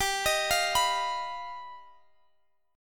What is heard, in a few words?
G Augmented 7th, Guitar, Half Step Down